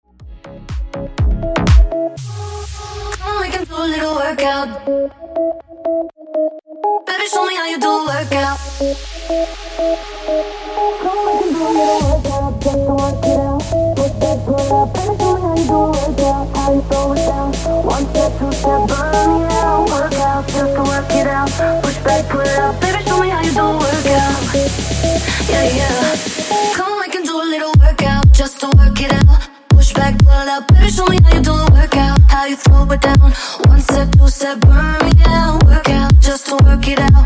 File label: Dj Intro Outro 122 Bpm